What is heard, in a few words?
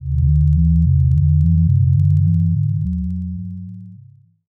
Sci-Fi Electronic Power Source Pulsing; Science Fiction